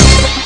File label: Synth Orch Hit; rare gems